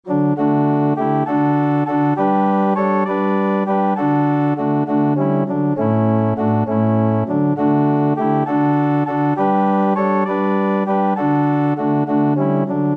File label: sweet hour of prayer, organ